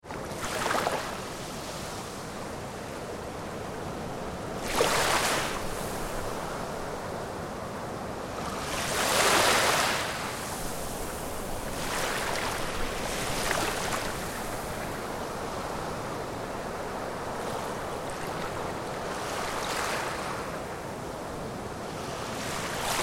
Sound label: Ocean Sea Small Waves onto Sandy Beach, Close recording; Ocean Sound Effects